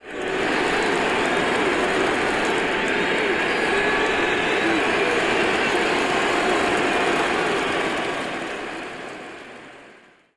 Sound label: Crowd Stadium Applause; people